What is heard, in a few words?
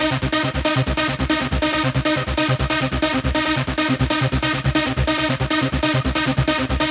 Root C 139 BPM; Vengeance Samples; Special Sounds